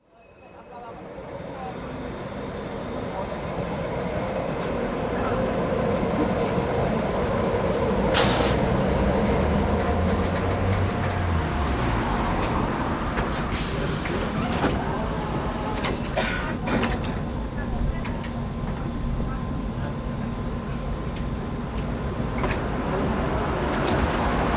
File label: tram no 16 at chlodwigplatz; koeln